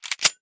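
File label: weapon pistol 10mm reload chamber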